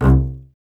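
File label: string contrabass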